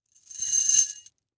Chains Rattle Short; Foley

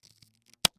Foley Rubber Glove Snap

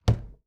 Household Door Close Entertainment Center

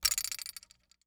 Cartoon Spring Cartoon Spring

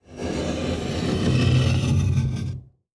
Household Door Open Shower Door Sliding

